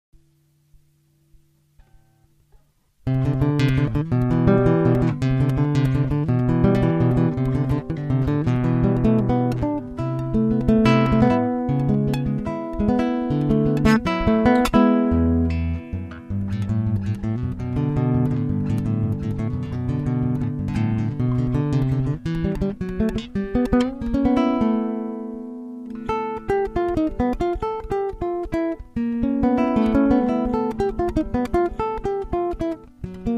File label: solo